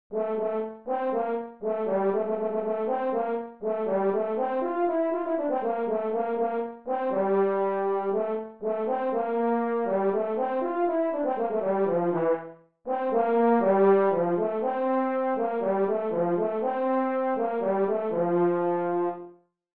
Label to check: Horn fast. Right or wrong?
Right